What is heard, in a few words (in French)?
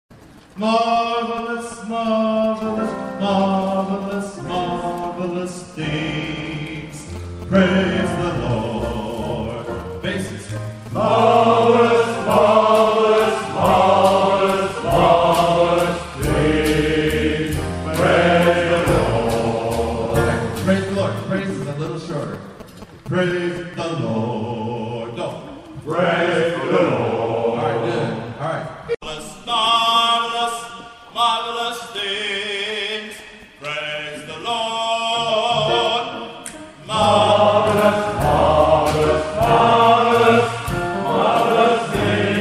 basse